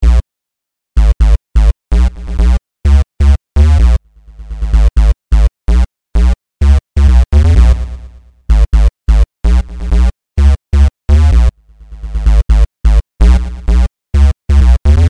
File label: bass; кислотка../сэмпл